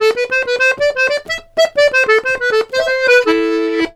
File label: RONDO, Accordions; USB Soundscan vol.40; AKAI